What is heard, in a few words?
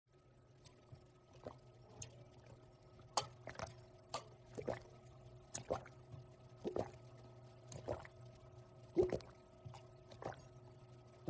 drink water meme sound effect